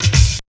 ROCK 132, Big Beat Drumloops

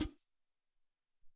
Ft Hat Tmb, Alesis SR-18

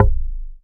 DM BAS, Dance Mega Synth; Best Service

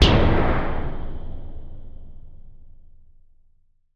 bang, space junk; Spectrasonic Distorted Reality 2

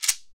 SHEKE, Afro Shakers, Shekeres

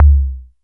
Tom - Low Tom, Wiard 300-Series Modular

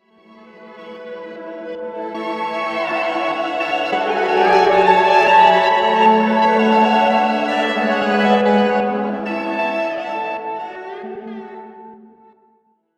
granulised string sweep; M8

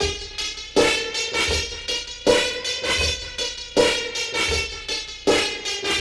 Flange Grind Loop, Drum Loops Processed; Zero-G Smoke

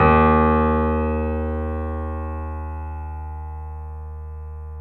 Small Pianos; E-MU Producer Series Vol. 5; 3D Multis

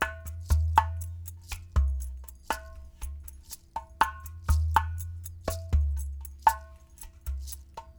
clay pot, world percussion; Rines, Shaker

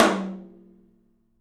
OPEN TOM; 3D Sound; 3D Drums

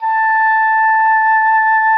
OBOE A5; Xsample VOL-6

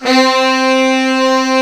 SAX C3; Giga Samples Collection; SAX+BRASS

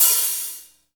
HH hh; Best Service Gigapack 2

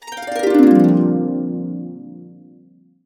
BB, Harps; USB Soundscan vol.62; AKAI

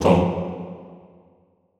TONG F1, Classical Choir; Best Service; Extended Classical Choir; SHORT TONG

